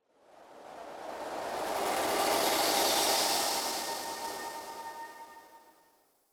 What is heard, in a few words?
Woosh L To R; from dust